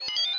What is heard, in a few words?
menu drop